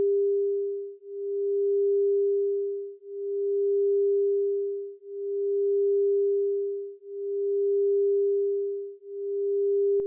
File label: beats